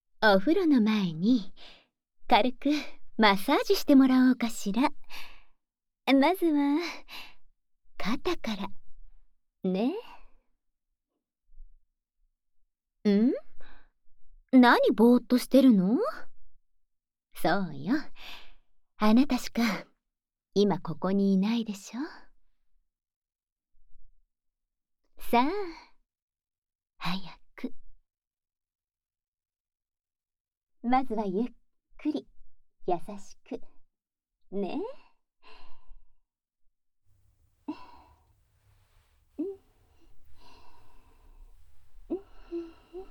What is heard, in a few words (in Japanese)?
マッサージ